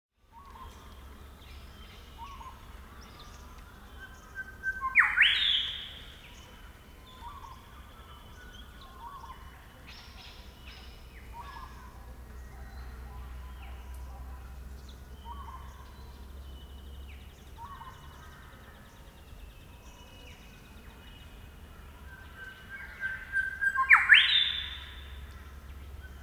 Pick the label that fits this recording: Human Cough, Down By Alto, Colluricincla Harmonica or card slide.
Colluricincla Harmonica